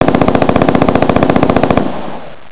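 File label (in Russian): perestrelka pulemet, machine gun firefight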